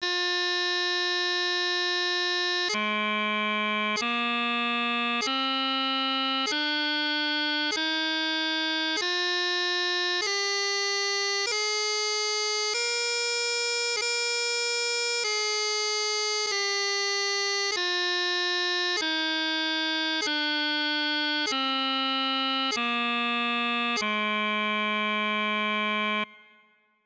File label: High G Gracenote Exercise, piping